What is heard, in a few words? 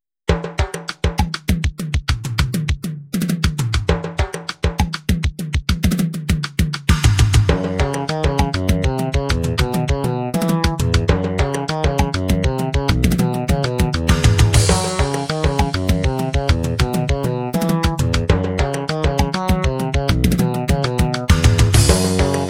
slap funk